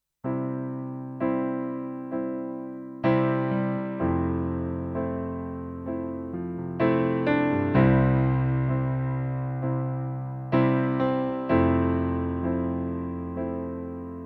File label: S51 Piano